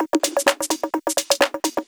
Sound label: Houseloops 128BPM; Vengeance Samples